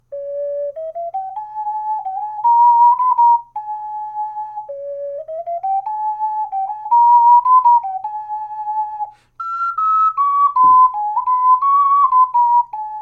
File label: ocarina; trine 2